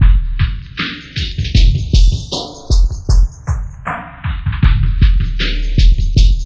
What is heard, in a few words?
FILTERS; USB Soundscan vol.35; AKAI